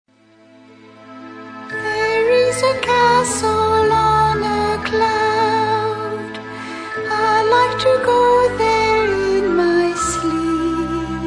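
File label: Cosette, Songs; Les Misérables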